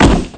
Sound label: body fall wood; Spearhead Demo